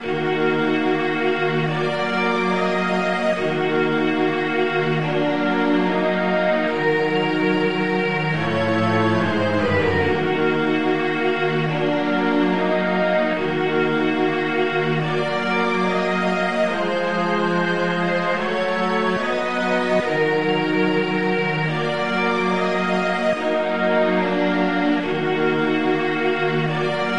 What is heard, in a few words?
Strings Remix